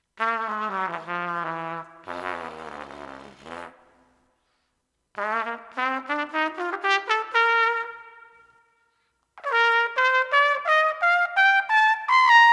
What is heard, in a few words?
tone